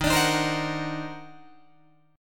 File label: D sharp Minor Major 13th, Guitar, DADGAD; strummed